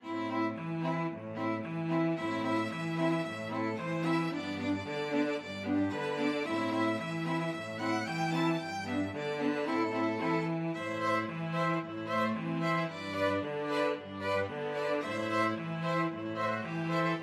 string quartet; drunken sailor

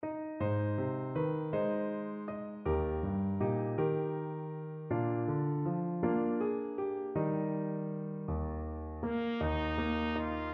chant de la promesse trumpet

